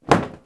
Flutter Short

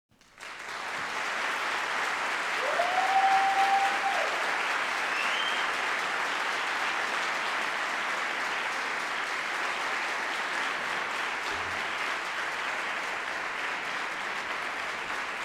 Applause

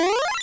Gameboy Jump

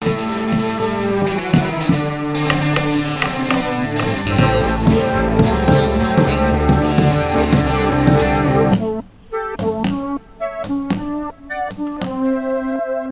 Pumped Up Tune

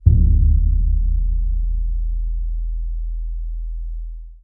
Taiko drum 41 Hz

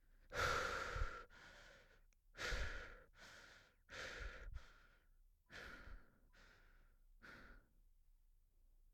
Heavy Breathing

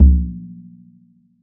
Shaman S Drum

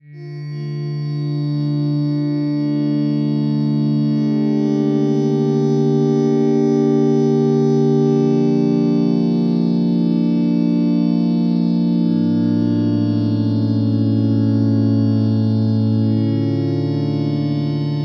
Dark ambiance

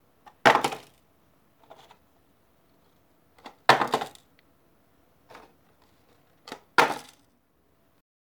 Hollow Box Pick Up And Drop

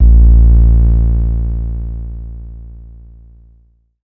808 A; Abletunes

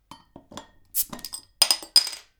Beer Bottle Opening

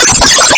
City Sweeper

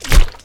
Water Bottle Shake Thump

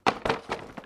Box Falling

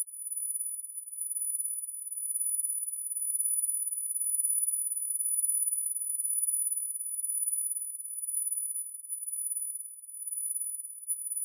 Ringing

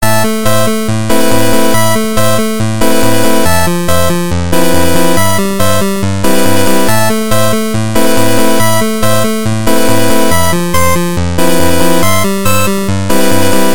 8 Bit Loop

